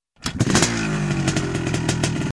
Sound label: Motorcycle Dirt Bike Start; transport; motorbike